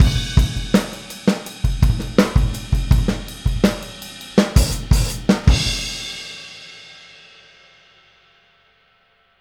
Boogaloo - Outro - Full Mix, 82 BPM; Sonic Foundry; Drums from the Big Room The Mixes

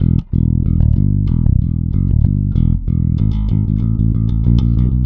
Funk, Bass; Sonic Foundry; Bass X

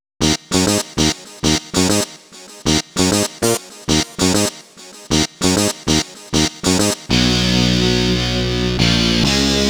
Power Guitar; Sonic Foundry (Sony Creative Software); Club Joints and Street Anthems; Session 11 - Rock Star

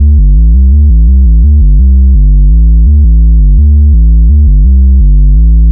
Synth Bass

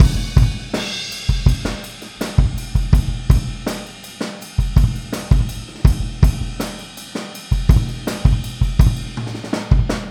Boogaloo Fill Kick, 82 BPM; Sonic Foundry; Drums from the Big Room The Mixes

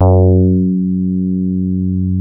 DEEP FM F#3, DIGITAL BASS; Music Store - Claudius Diemeter Schwerlos

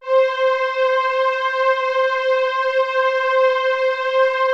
PWM STRNG C4, SYNTHSTRINGS; Music Store - Claudius Diemeter Schwerlos

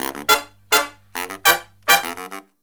108 COOL, Killer Brass Riffs; USB Soundscan vol.29; AKAI

